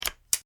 Spring Hook Foley Rock Climbing Clip; Latch; Latches

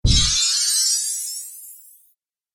Explosive Magic, magic sound effect; Magic Sound Effects

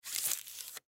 Wax Paper Handle Movement Fold; Kitchen; Household; Blastwave

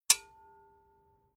Weapon Sword Old Metal Hit Light Long Ring Out; Late 19c; Tom Hutchings

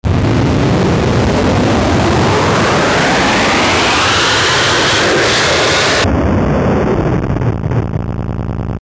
Power Up Wind Distortion; Rise And Dive; Blastwave

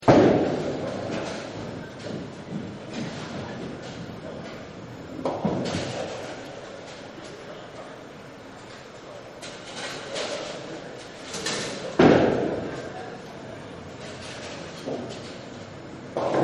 Bowling Alley Atmos; Bowling Alley Atmos Loop; Sports; Partners In Rhyme

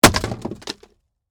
Impact Wood Hit, Sledgehammer, Debris; Hammer Sound Effects; Hammers (Sound Effects:Tools); BlastWave

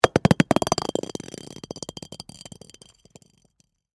Ice Object Roll, Across, Ice; Texture; Rolling; BlastWave

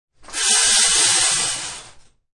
Shower curtain opened or closed.; Bathroom; Household

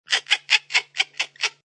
Evil Doll Laugh; An evil doll laugh; Evil Laugh Sound Effects; Sound Effects:Horror

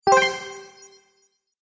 Bright Magic Bonus, video game

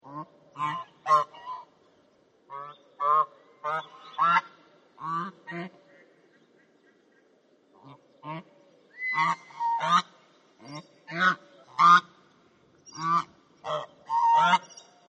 Geese; Birds; Animals

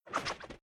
Whoosh Sweep By Spatular Whip Spin Left To Right

